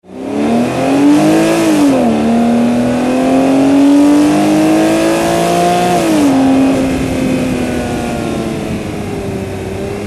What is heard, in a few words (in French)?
boxster drive past, Porsche; (2005)